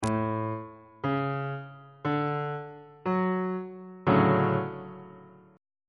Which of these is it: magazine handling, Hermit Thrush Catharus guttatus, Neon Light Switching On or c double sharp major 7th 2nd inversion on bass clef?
c double sharp major 7th 2nd inversion on bass clef